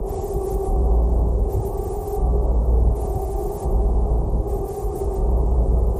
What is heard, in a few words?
Factory Loop 80